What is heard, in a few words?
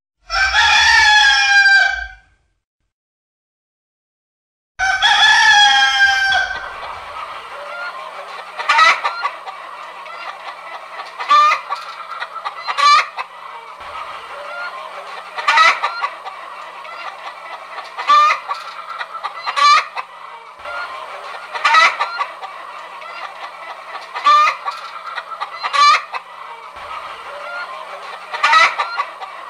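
poultry yard sounds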